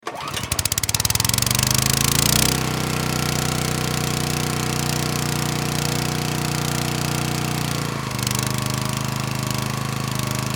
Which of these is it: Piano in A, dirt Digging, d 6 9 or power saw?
power saw